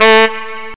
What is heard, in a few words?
sax